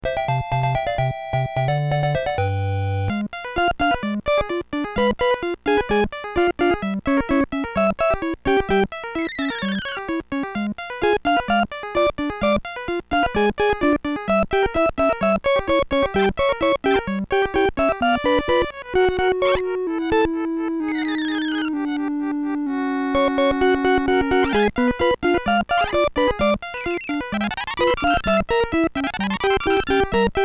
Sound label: Soundscape